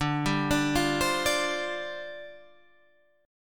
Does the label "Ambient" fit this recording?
No